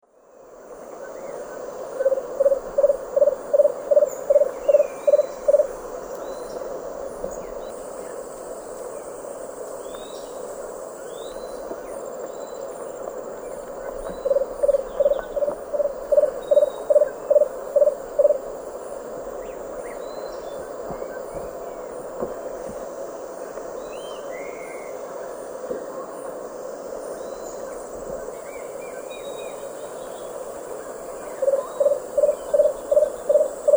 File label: Eubucco richardsoni, song; S. America; Eastern Lowlands